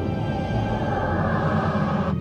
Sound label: Cloak; trek; Defiant